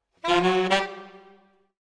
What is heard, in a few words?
Brass, Jazz for piano